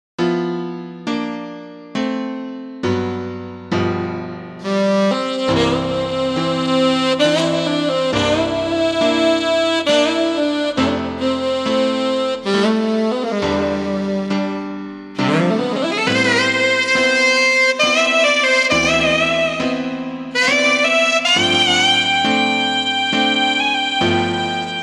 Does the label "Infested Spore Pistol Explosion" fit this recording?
No